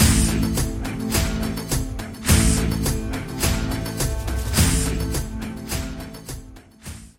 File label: piano loop